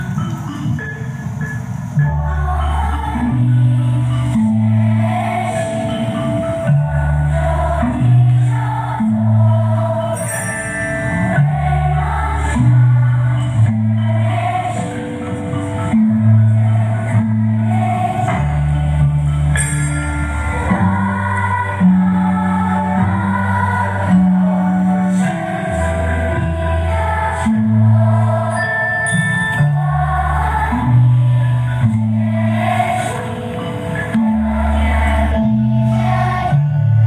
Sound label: Children Singing Chinese